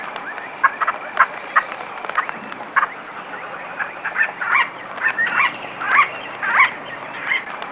ATM SWAMP, Swamp Atmosp

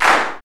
gate clap; Greytsounds VOL-1; PROCESSDRUMS

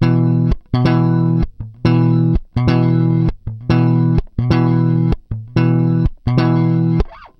Groove Pluck, Bass; Construction Kit; Riffs, Patterns Licks; Bass X

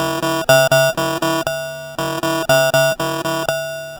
Guitar Loop, 120BPM

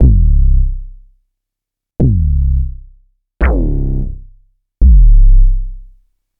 Kick Comp and EQ

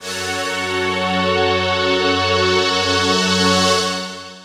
Pad Swell F#; Single Shots; Misc; Sonic Foundry (Sony Creative Software); Ugly Remnants VOL-2

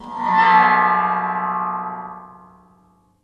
IND; Zero G; Man Machine

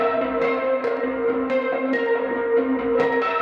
Cigar Flange FX 140 C, guitar drone

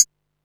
PERC, Techno 138 BPM; Best Service ProSamples vol.54; AKAI

